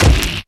Melee Magical Medium; Kilta

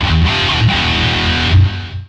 Pickup Guitar Riff; SFX Kit; Pickups

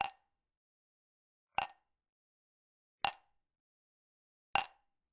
Generic Click Glass Tiny

